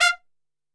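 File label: F3 trumpet shot, Brass Super Section; Best Service; TRP SHOT; 90 s Sample CDs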